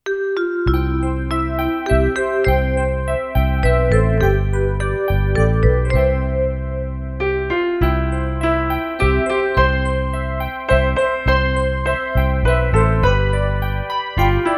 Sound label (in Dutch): dikke zoen zonder zang